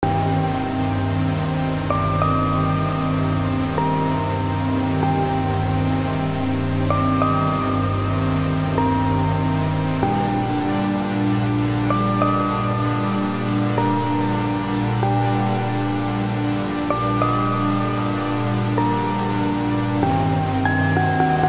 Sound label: analog